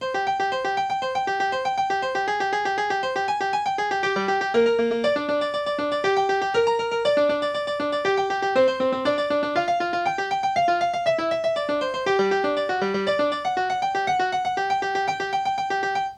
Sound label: Piano Rythms